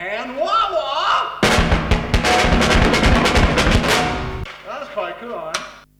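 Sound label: drum 120, Wah Wah 120bpm, Breakbeat; Best Service ProSamples vol.40; And Wah Wah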